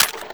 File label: ar2 reload rotate; HL2-Nerv; Fisher